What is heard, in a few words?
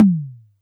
Tom High